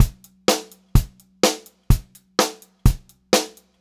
126 Pop-Rock Drumloops; USB Soundscan vol.37; AKAI

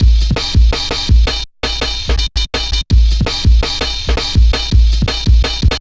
Klanga, drum loops 165.5

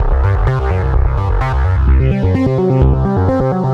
Sequence, 128bpm, C, analogue circuit; musicradar

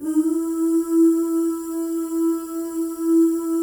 FEM OOS, Symphony of Voices; Spectrasonics

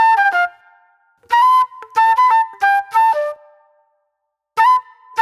J-Funk Flute, Call And Response

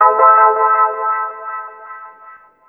PIANO HIT; USB Soundscan vol.27

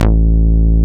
A-BASS, AN BASS

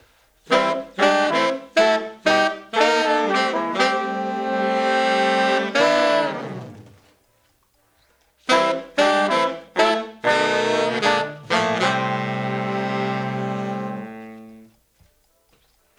Horns, 70s Analog Funk

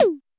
Roland U220 Electric Set, Drum Machines

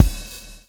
SWNG

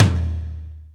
Verb Tom Lo, Roland JV-2080

